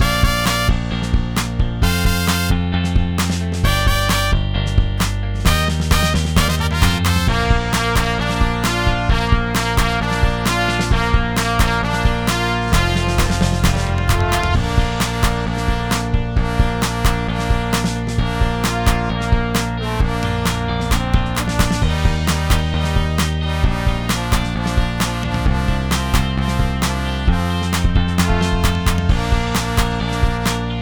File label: Bb Jingle Bells 132